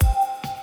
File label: Whistle Kick, Drums; BB 2HTC Samples Vol 4